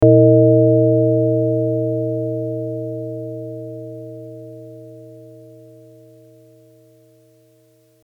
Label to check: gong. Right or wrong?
Right